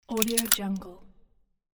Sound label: lock